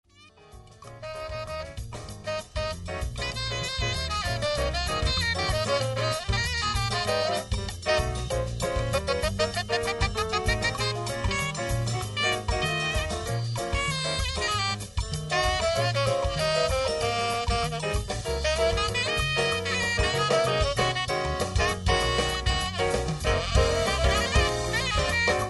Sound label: mambo